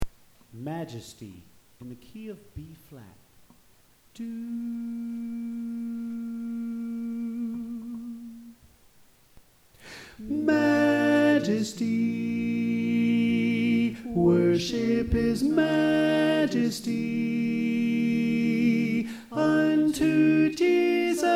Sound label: Tenor